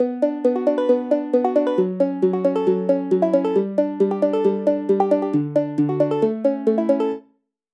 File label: Hybrid Standard Country; midi wizard